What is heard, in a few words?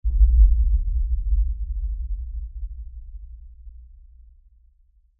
Impact Lfe Blast Rumble; Blasts; Sound Effects:Impacts; Blastwave